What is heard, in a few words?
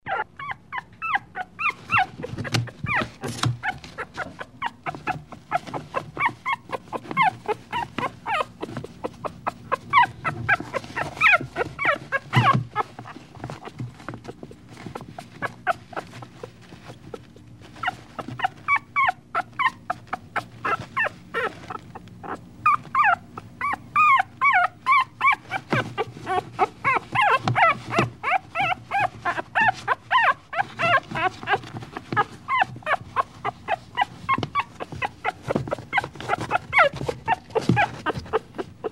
puppy whines; dogs